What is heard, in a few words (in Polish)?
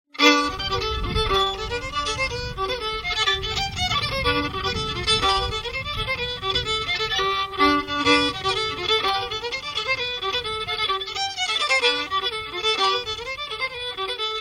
dudka polka